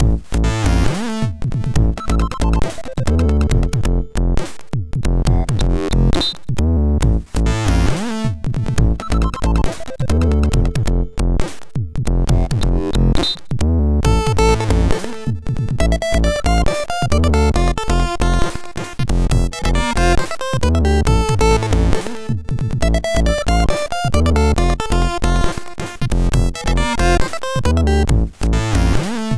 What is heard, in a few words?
sid MOS6581R2